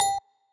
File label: marimba; ゆめ2っき